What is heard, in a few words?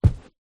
footstep single carpet wood floor boots walk; foley; zapsplat